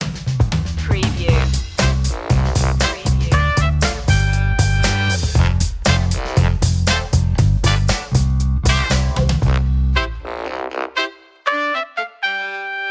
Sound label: fresh groove